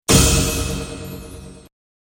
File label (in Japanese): ジャン